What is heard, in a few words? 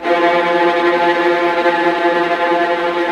VIOLINT G, Synclavier Strings; ILIO